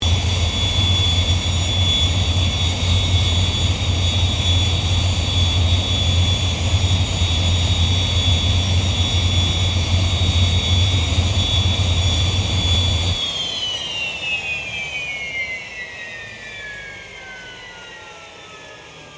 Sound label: AIRCRAFT CA-27 SABRE IDLE SHUTDOWN; CA-27/F-86 Sabre; Airplanes; Vehicles